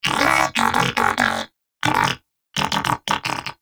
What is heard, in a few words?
Moa Pet Tenacious Fight Idle; Pets; Warframe